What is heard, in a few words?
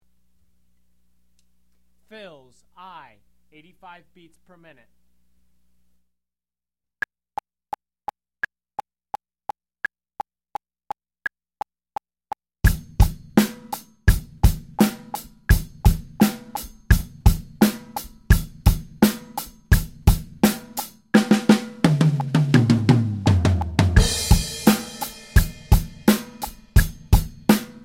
fills, Beginner Fills 85BPM; Drum Lessons